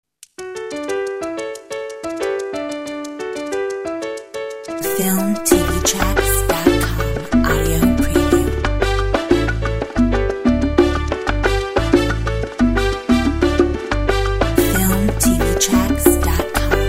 Salsa Libre

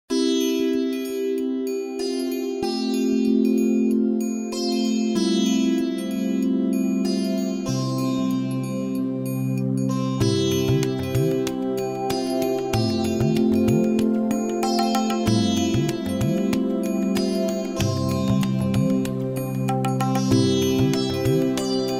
Ambiance World; FILMTV-TRACKS

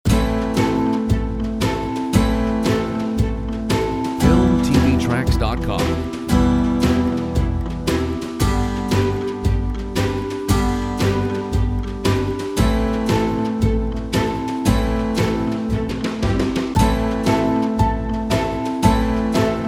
Quirky pop

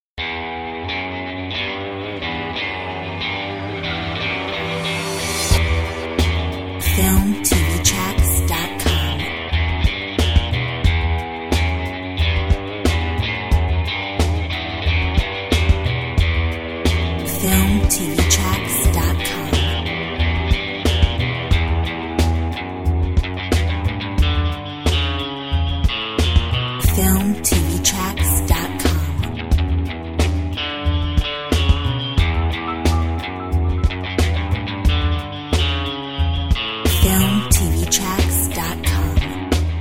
Ambient; Filmtv-tracks

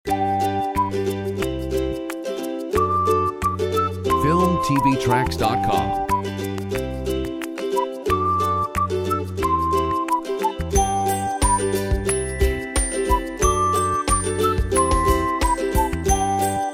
Joyful Ukulele

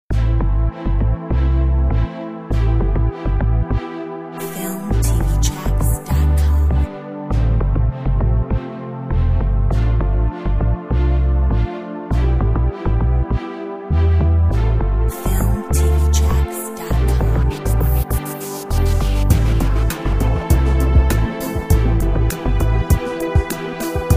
Corporate excellence; Filmtv-tracks

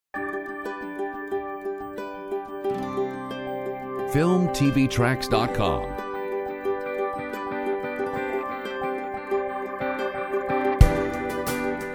drums no vocal

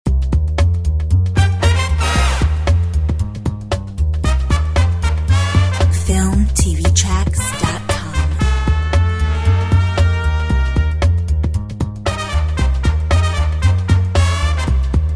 Nu jazz

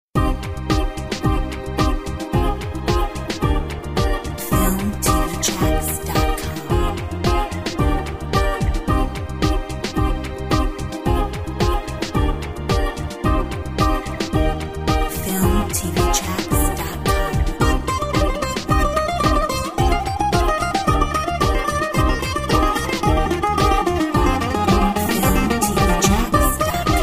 Bouzouki party

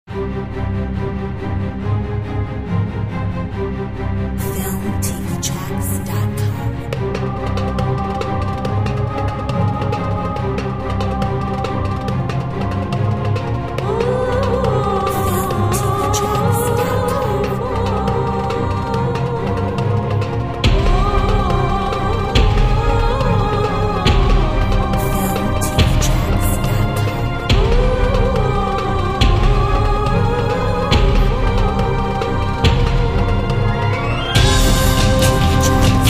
Desert Kingdom Vocal

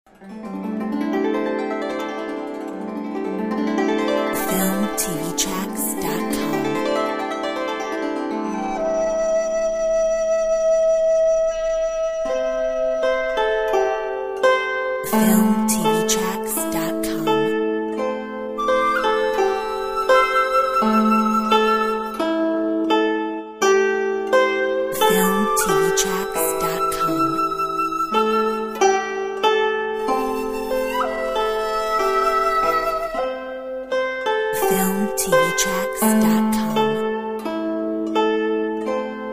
Tibet

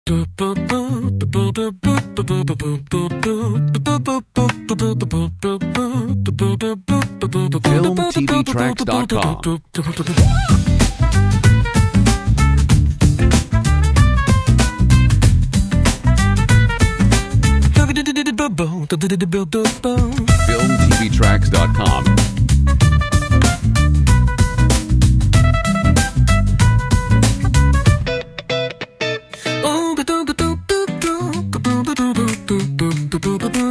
BOSSA HIP HOP; comedy funny

